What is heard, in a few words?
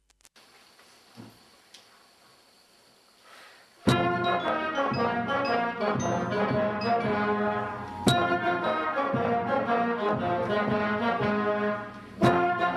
cheering instrumental